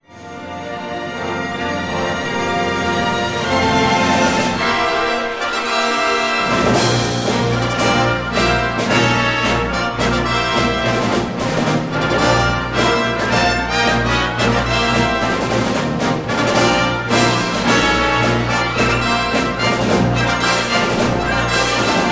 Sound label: Fanfare Overture